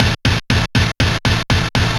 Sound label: 8bit Hit; Sonic Foundry (Sony Creative Software); Dr. Fink's Funk Factory; Orchestral Hits